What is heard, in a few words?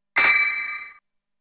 Ice Block; Namco Game Sound Express Vol.1; Valkyrie no Densetsu